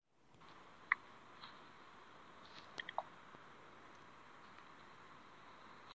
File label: tapping sound